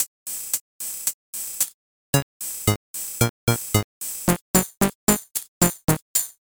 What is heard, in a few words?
Tutorial (Crow Choir); Beat City; ds gamerip 2010